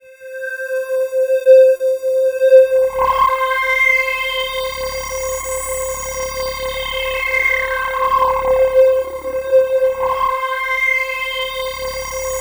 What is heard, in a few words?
SynthLine C6; 90 s Sample CDs; NiceBeats; SynthLine VOL-13